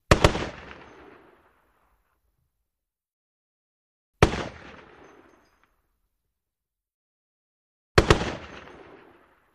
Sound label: .50 Caliber: Double Shots And Single Shot With Shell Movement.